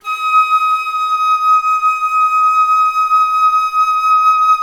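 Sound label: flute legato forte D5, Orchestra; Best Service; Peter Siedlaczek's Advanced Orchestra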